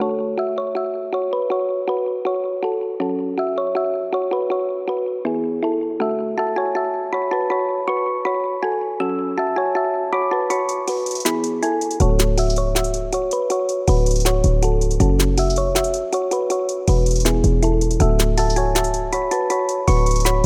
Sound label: Flute Loops